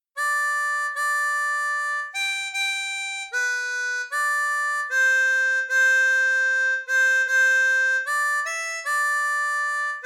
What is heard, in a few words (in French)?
Hymne Luxembourgeois, harmonica